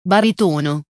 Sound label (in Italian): baritono